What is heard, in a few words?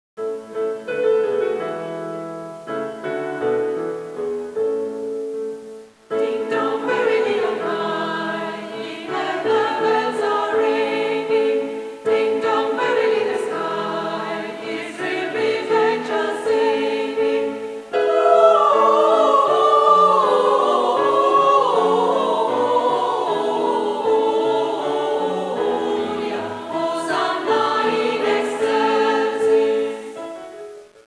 ding dong